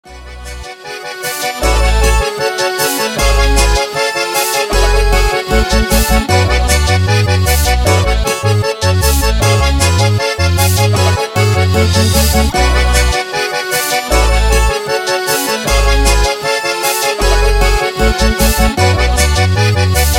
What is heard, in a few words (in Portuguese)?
toque da sanfona para celular